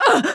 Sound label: damage; MonsterHunter; Fight